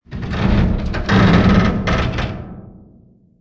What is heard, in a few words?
ac130 metal stress; amb elements; modern warfare 2